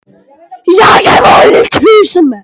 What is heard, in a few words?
hahahah